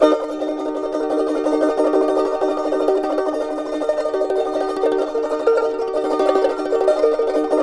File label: CHAR E MJ TR, charango; Spectrasonics; Hans Zimmer Guitars VOL-2; CHARANGO CHD